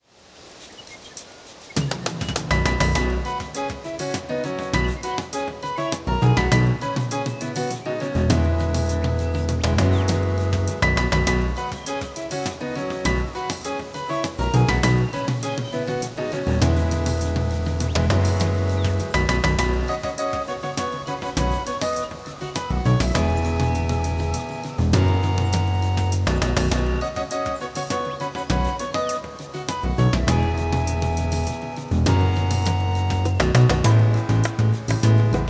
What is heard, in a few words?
Jungle, With Rain; Wario Land: Shake It!; Ropey Jungle